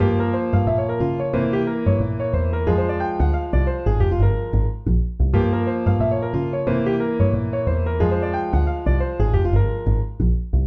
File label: harmonizations